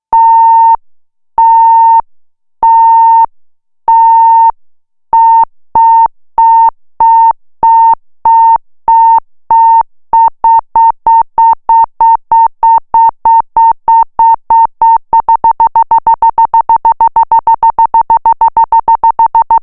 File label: Self Osc VCF, synthesizer